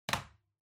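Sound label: Ceiling Light (remote control Put)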